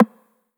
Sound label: Perc Rim Clean, 909 Percs, Vintage Drum Machines; Slate